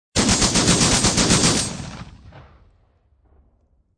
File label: Artillery Gun Designed; LYN084 Guns & Military sound package by Lynne Publishing; Cannon Sound Effects